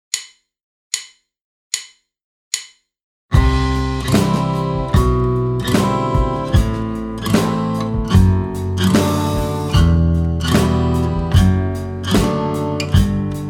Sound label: liven up chords